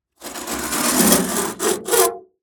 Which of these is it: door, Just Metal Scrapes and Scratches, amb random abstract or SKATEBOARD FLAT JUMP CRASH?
Just Metal Scrapes and Scratches